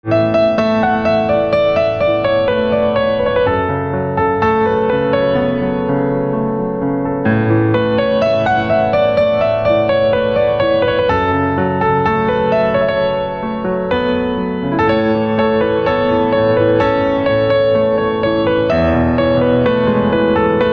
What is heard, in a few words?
Piano Instrumental Ringtone